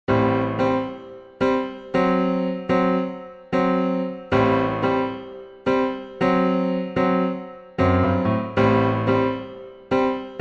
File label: Latin Groove